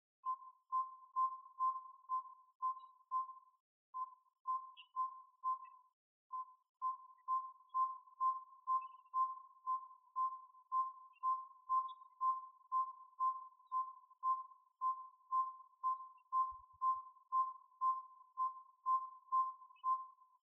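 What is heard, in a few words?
Northern Saw-whet Owl toot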